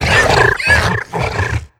minion run chatter; Attack of the Radioactive Thing

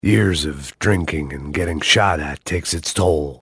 battlecry; max payne

